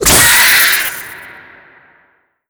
die, headcrab black; creatures; vox

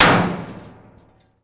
bullet metal auto, physics

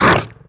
grunt hard, creatures; vox

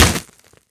concrete impact bullet

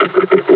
laugh, combine charger; Half Life Alyx

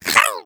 eggbot jumps, taunts; player; Portal 2

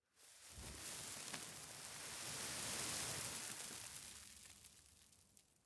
sand gust; Counter Strike Model Pack; CS:GO; dust2

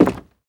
wood, player footsteps

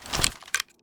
scar20 draw; Counter Strike Model Pack; CS2

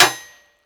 bullet hit shield; physics

